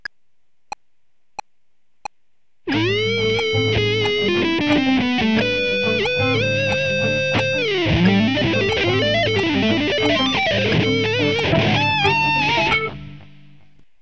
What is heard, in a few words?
Lick, guitar